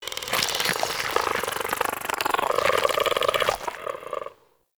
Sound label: Plant Smoke Stack Creak; Ambience; Props; Warframe; Venus Open World; Venus Landscape